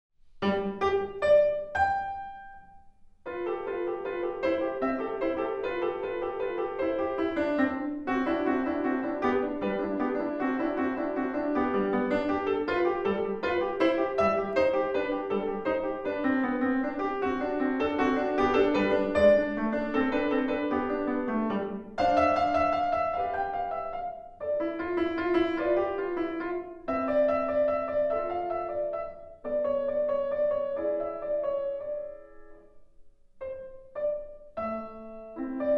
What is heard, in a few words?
Piano, Scherzino; Encontros com a Musica de Sao Paulo